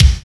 KIK IN THE, DNC KIKS; Drums In Your FACE!

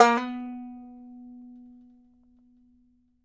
BANJO NOTES, B 3; Sampleheads; Whole Lotta Country 1 & 2

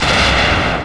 NOISE, Production Effects

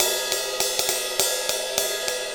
Ride Cymbal Pattern

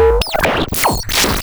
Cwejman S1 Dong

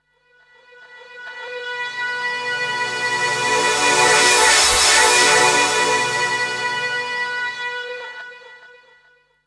Trance Sweeps; Vengeance - Essential Clubsounds Vol.2

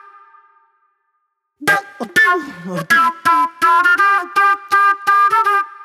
Classic Soul Flute

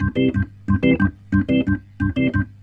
Roots Reggae, B-3; Sounds Good - Roots Reggae

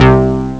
octave bass; ST-XX Amiga Soundtracker Sample Packs

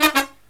LOW HIT, Brass

